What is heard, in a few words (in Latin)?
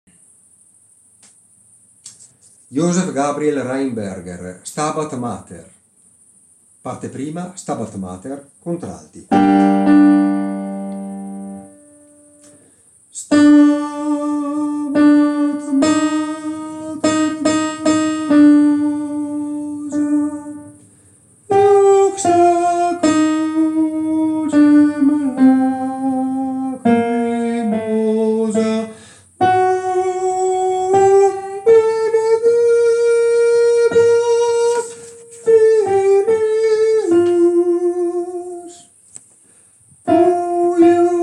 Stabat mater Contralti